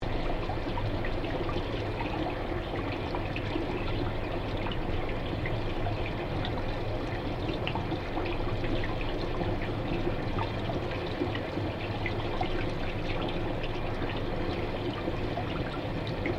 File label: Underwater Pool Filter Operating Loop, Swimming pool filter recorded underwater.; Machinery Sound Effects